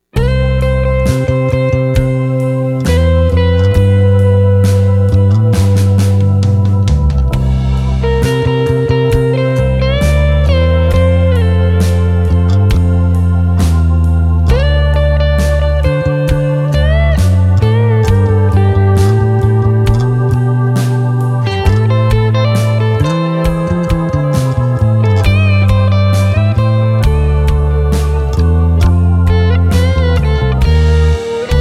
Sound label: Bassline